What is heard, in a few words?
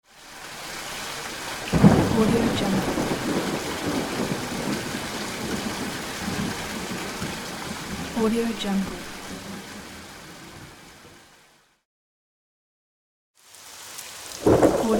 rain with lightning